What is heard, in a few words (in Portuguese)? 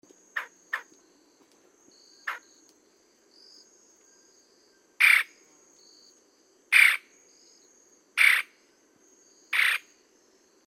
canto do chupa dente grande